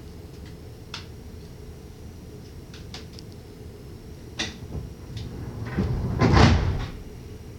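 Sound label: Door Close, Train; Re460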